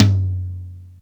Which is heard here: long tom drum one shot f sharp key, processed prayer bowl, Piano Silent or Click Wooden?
long tom drum one shot f sharp key